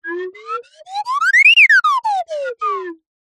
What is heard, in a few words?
cartoon slide whistle ascend then descend stutter